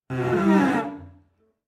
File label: Cello Scary Movie; SoundsCrate